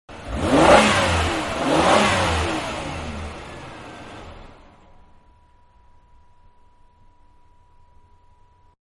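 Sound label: BIG CAR Accelerate OFF EXTERIOR; Cars; Vehicles; Car Big-Accelerate And Off; Manhattan Production Music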